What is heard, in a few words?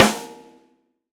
snare, gretsch kit